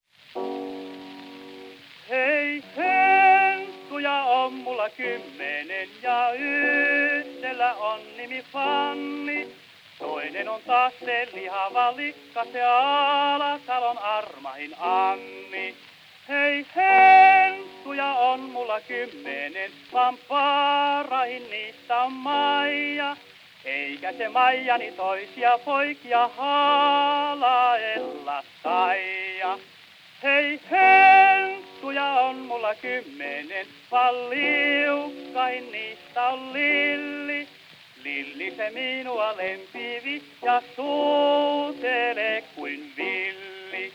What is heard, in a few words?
Gramophone